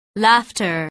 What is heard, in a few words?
laughter